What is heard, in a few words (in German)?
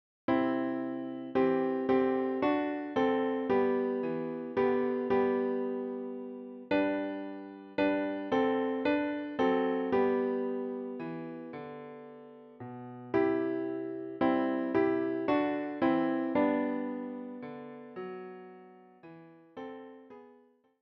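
Leise rieselt der Schnee Klavier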